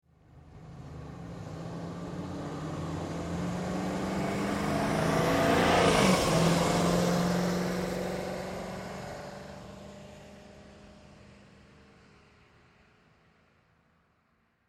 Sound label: Auto Pass By Automobile Car; Vehicle; Cars; Vehicles